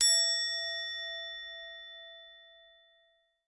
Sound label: tin, anime